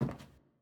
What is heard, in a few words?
Footstep Wood